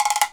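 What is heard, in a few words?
WOODBLOCK; ILIO; Synclavier Percussion 2; World & Orchestral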